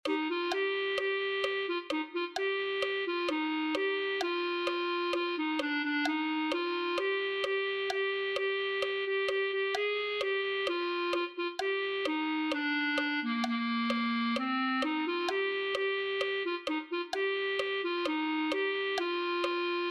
clarinet

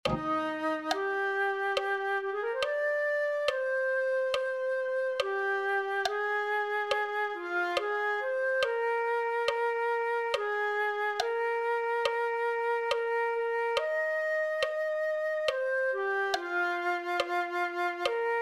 flute

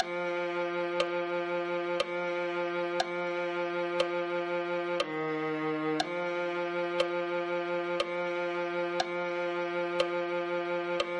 viola

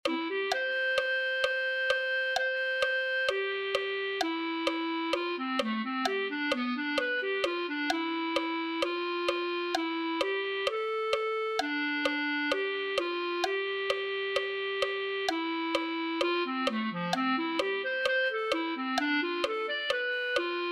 clarinet

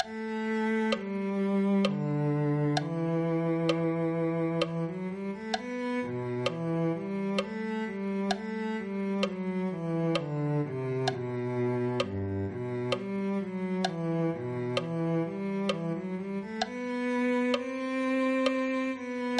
cello

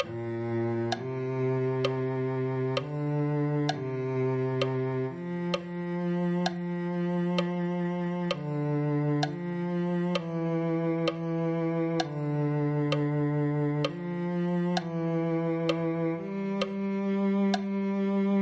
cello

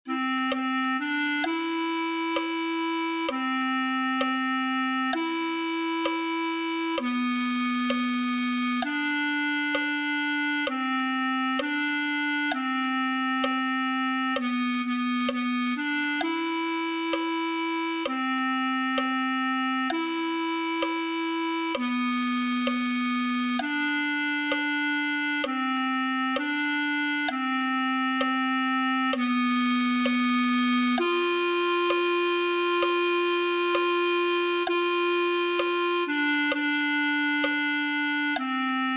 clarinet

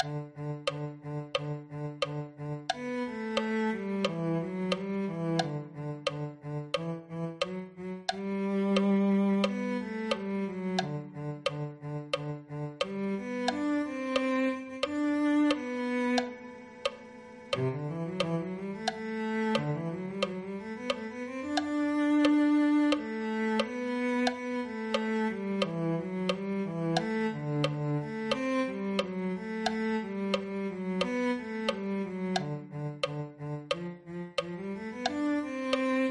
cello

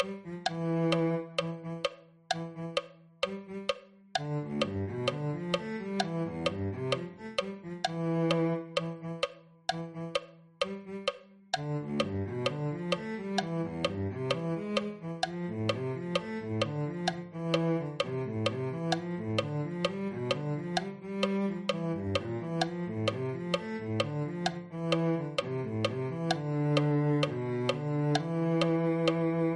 cello

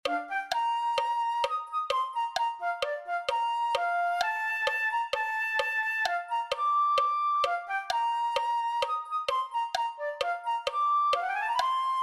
flute

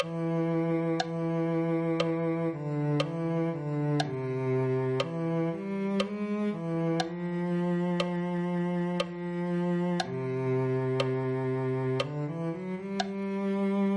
cello

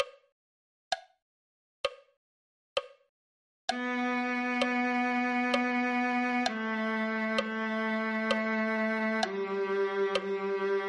viola

